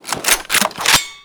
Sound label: reload